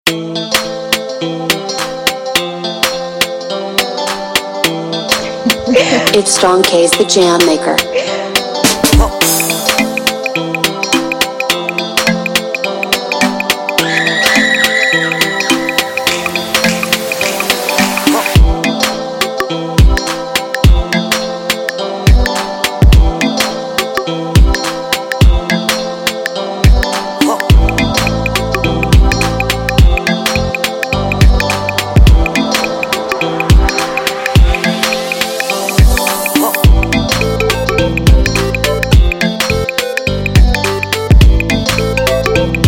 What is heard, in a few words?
JUJU HIGHLIFE INSTRUMENTAL BEAT